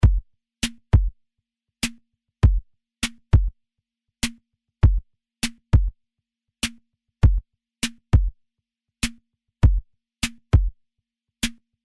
drumloop; plamen

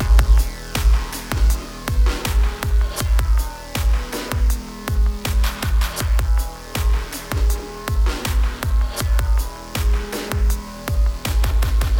Drum Synth Sidechain

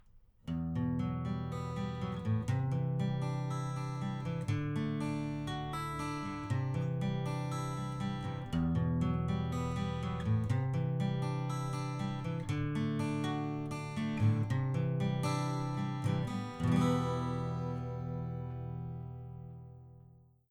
Guitar SubCardiod 180º

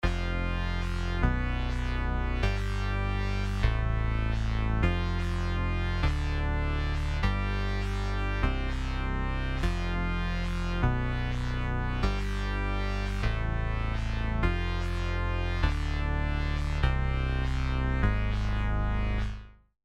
bass dry

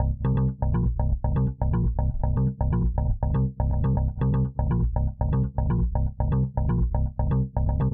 Bassloop DRY

